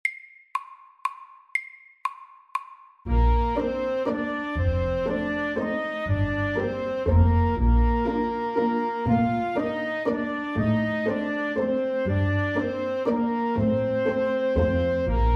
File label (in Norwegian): Legato vals FORT